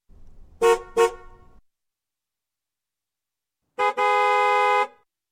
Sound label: Car Horn x 2; Sound Ideas; Series 2000